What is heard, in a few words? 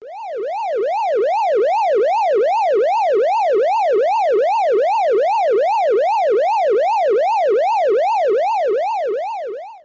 ghost normal move; pacman